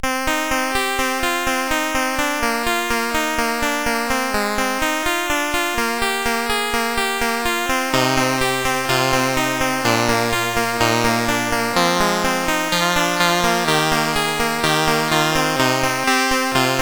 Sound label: Concerto, CSG8580R5; Little SID; Short Concerto